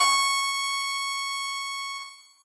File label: piano; pianojs